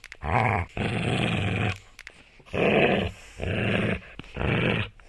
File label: dog growl